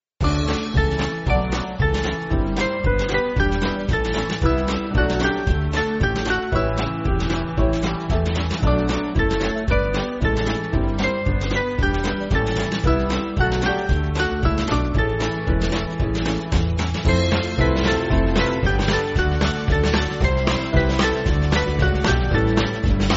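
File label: Band, Music Backing Files for Churches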